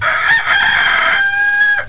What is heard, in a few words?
rooster; clock